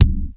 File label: KCK LT, drums; Greytsounds VOL-1